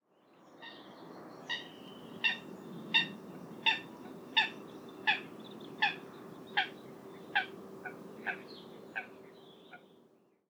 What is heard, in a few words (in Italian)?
Folaga Fulica atra; Olivier SWIFT